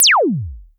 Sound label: korg trident